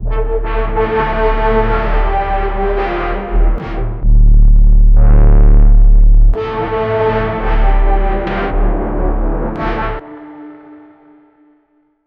a punchy and tight electr, dark

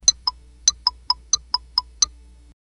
trivia countdown funny ga

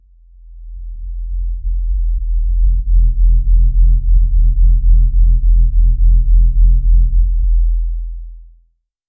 suspenseful undercurrent, underscore

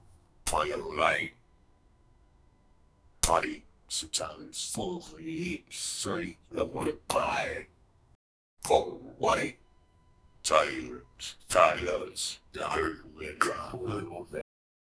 a goblin with a gun, gunfire

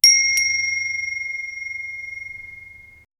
a single tingsha meditation

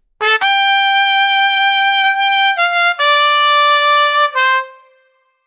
two second christmas trum

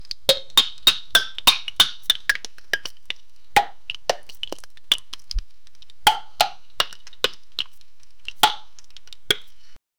a soda can opening except

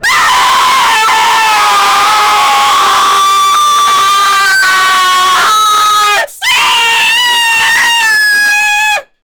screamer clown

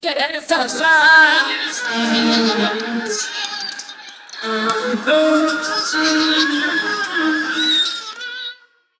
glass, sound-effects

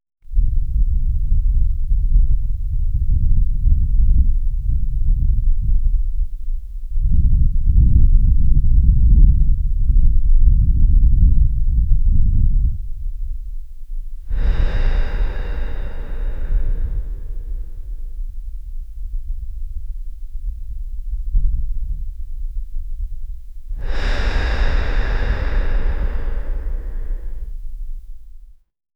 soft exhale of cigarette; air movement

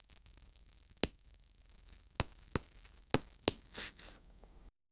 finger sliding on old par, parchment

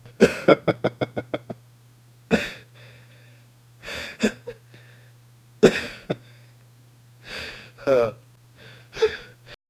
a soft quet male chuckle; 18 years old

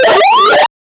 orc death sound like; warfare

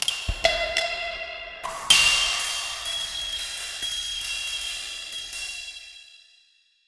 static broken midi notes, bitcrushed

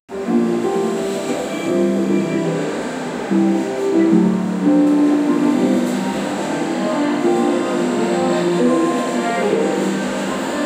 long music background sou, cafe

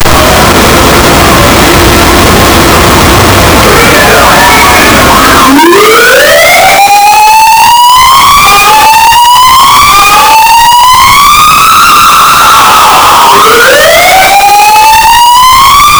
airport fire alarm, evacuation; changi

